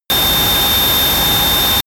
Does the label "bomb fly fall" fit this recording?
Yes